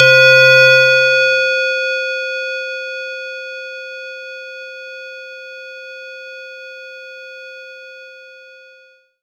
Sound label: C3, Synth Line